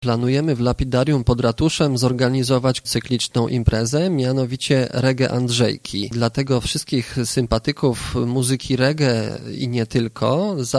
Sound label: reggae